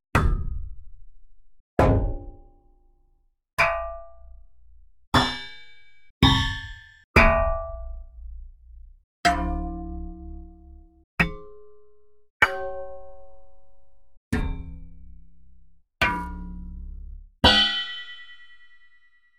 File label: Metal Impact